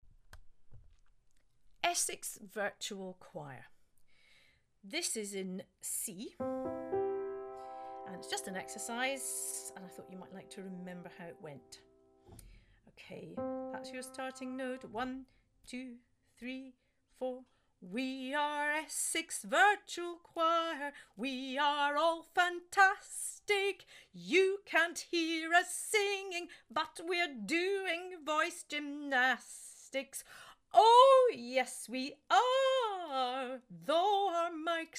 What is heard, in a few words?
Virtual Choir Exercise; Essex